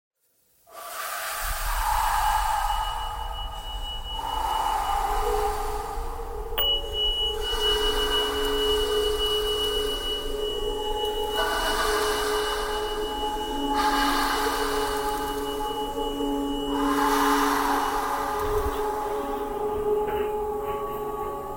breathing thread noise

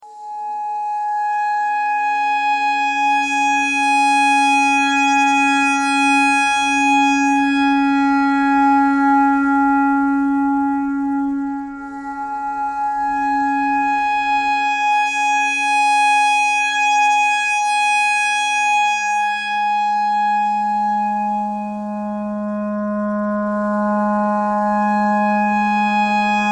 clarinet clarinet notes e simple

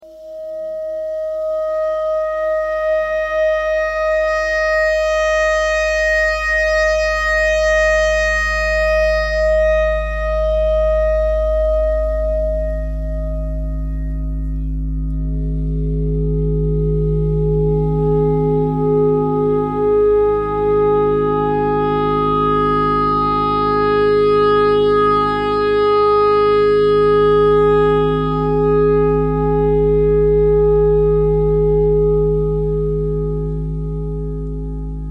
clarinet notes e clarinet thread simple

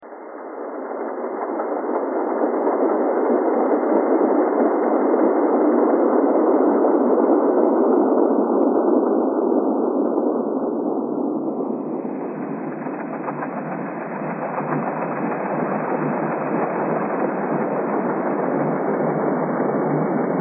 thread noise

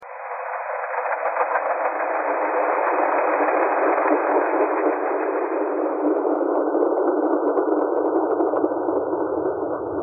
thread pentatonic

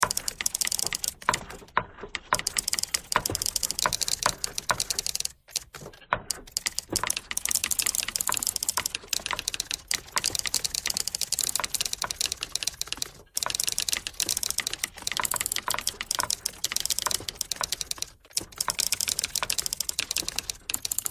typewriter thread all

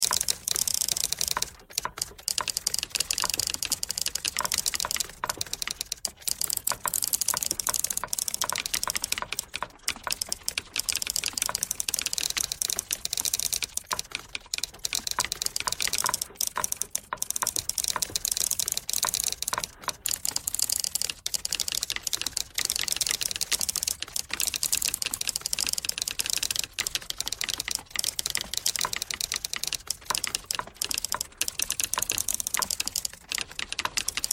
typewriter thread all

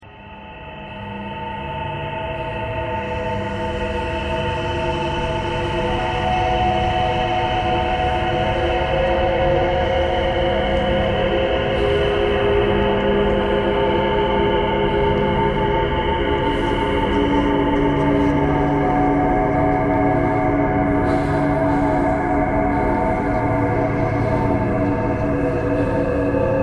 thread noise

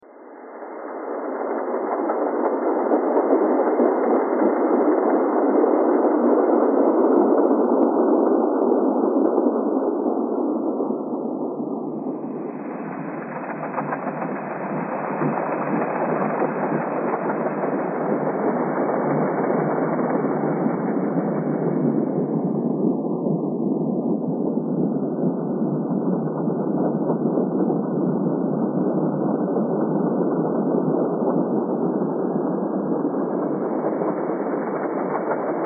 thread noise

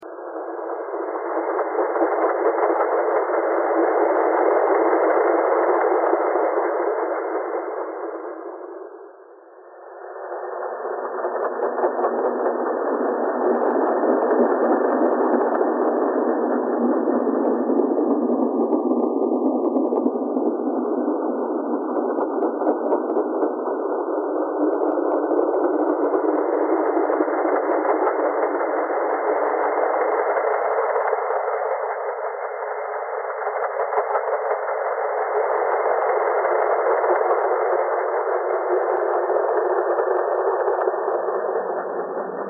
thread buzz

